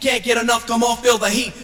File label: VOX SUNG; AMG Megabass REMIX! VOL-2